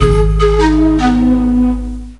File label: Aztec Lose; Mystery Tales: Time Travel; NDS